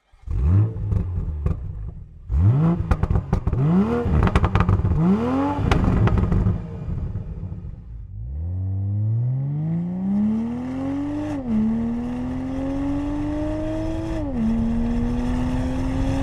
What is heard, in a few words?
Slip On BMW Z4 M40i